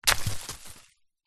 foley small stone drop forest floor into leaves

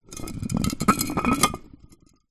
glass bottle wine roll concrete ground; foley

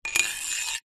small metal object coin slide inside metal pole; foley

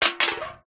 food drink soda can empty drop wood deck

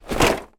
impact box full of plastic light items drop light

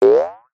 cartoon boing ascend jaw harp; zapsplat

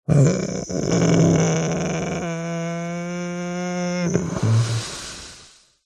human male light moan groan quiet ill weak pain; zapsplat

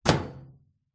household microwave door close hard

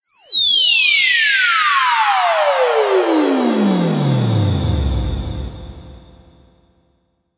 sound design falling futuristic tone; zapsplat

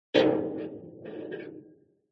impact metal huge heavy hit with scrape

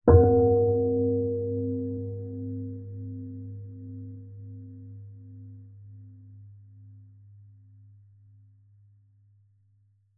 bell large heavy soft hit chime; zapsplat

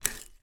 food spoon dig into bowl of cereal

